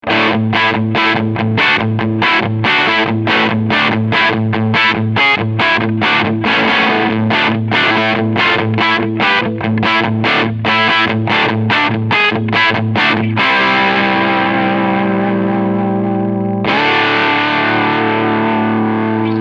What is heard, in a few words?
Medium Gain no Boost